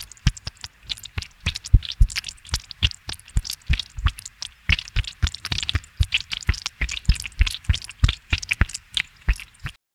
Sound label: wet slime rhythmic; textures